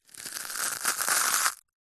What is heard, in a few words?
food cereal cornflakes handful squeeze crush